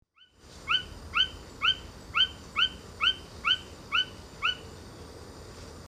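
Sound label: pulchrana laterimaculata, Masked Rough-sided Frog call; Venus Drive, Singapore, 02 Nov 2016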